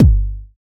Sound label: verby bass drum sample g key, Royality free steel kick drum sample tuned to the G note. Loudest frequency: 86Hz